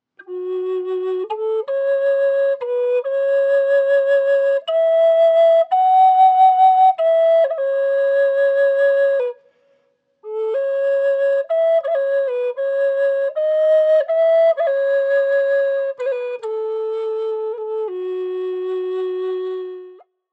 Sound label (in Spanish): tono G, flauta medicina roble; varias tonalidades